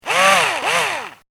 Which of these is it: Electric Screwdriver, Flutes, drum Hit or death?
Electric Screwdriver